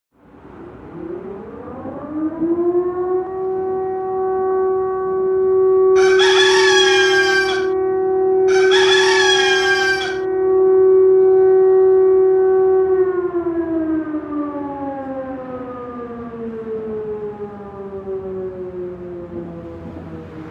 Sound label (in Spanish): Gallo Despertador